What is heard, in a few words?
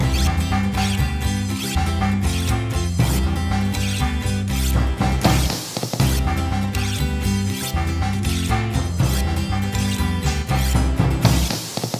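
food world music inferno no vocals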